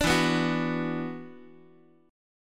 E 7th Suspended 2nd, down, Guitar; E7sus2; strummed

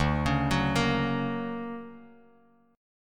D Augmented Major 7th down Bass Standard; D+M7 arpeggiated; JGuitar

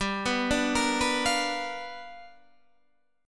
G 7th Sharp 9th G, Guitar Standard; G7#9 arpeggiated; JGuitar

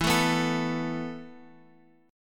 E Suspended 4th E, down, Guitar, Open F; Esus4 strummed

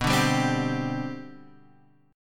C Major 7th Suspended 2nd B, chord

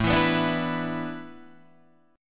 A Suspended 2nd Suspended 4th, Guitar, Open D

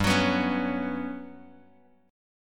G Major Sharp 11th G, Bass Standard; strummed; JGuitar

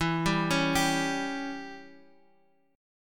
E Minor 6th E down; arpeggiated; Em6

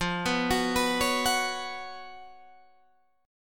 Db 11th F, guitar

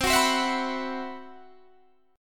C Major 7th Flat 5th, Guitar DADGAD, chord

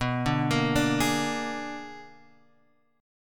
B-Minor Major 13th, Guitar Open G

